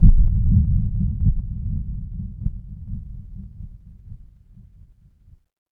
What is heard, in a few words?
horror heartbeat